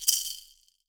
SHAKE, Percussion; Miroslav Vitous - Percussion & Harp

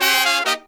Pop & Funk Brass

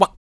KUK, MALE PERC

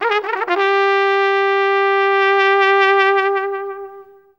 ORIENT TRUMP; Best Service; World Colours

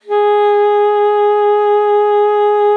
G#4, ALTOSAX; Xsample VOL-2

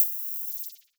HH, HIHAT

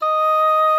ENGLISH D#5, REEDS; Greytsounds VOL-1

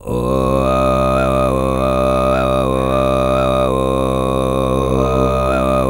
TV FREE, Vocal World Voices TUVAN LOW; ILIO; Vocal Planet VOL-5

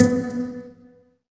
SYN PIZZ, JD 800; Sound & Vision - Gigapack I CD 2; JD 800/990

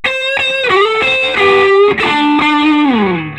SOLO, Vintage Blues Guitar; USB Soundscan vol.22

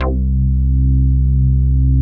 JX HARD C3, HARD BASS; Drums In Your FACE!

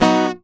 OVATION; Best Service; Alpine Volksmusik

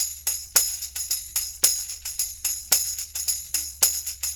tam; Percussion

